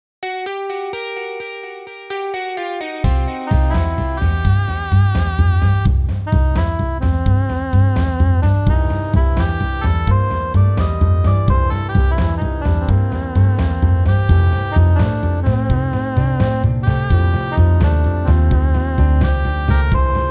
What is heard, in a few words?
MIDI blues